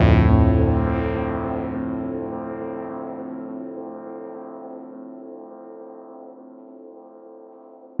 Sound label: dub chord